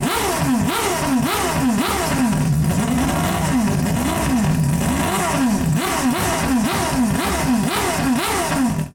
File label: f1 engine